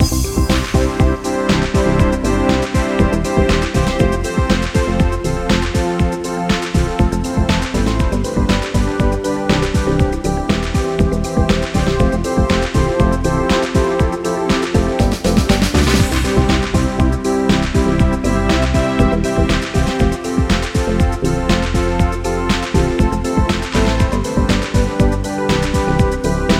trance loop no lead 120

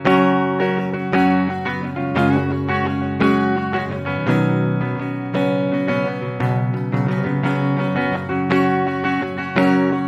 guitar folk 6/8 85bpm

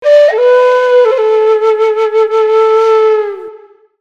whistle

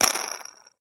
cotton reel reeling

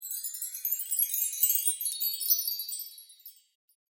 u chimes

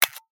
old camera open shutter

